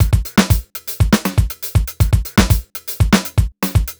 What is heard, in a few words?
120 basic, Nu Jazz House Beats; PowerFX